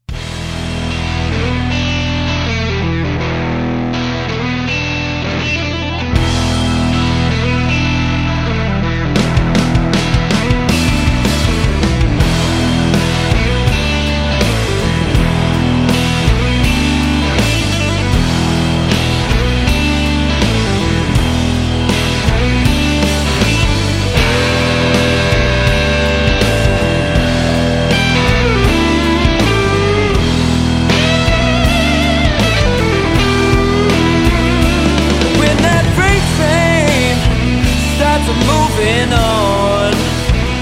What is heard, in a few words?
Blues